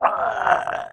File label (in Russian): udar